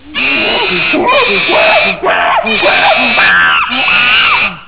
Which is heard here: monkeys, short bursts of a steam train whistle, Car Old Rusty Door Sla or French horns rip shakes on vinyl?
monkeys